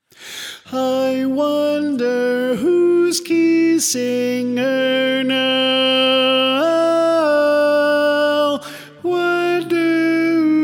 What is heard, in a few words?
Tenor